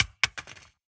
phys mag drop smg poly concrete, magazine drops

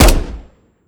fire, weapons; gmod

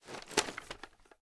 drop, weapons; gmod; viper

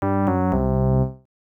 fail tune, basic slot machine; gmod; pcasino

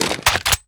scavenger pack pickup; gmod; viper

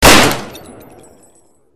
uzi; gmod; perp2.5

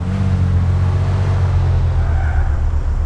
rev short loop, vehicles; primo

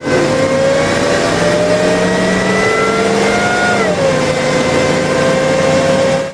third, vehicles

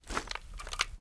reload raise; gmod

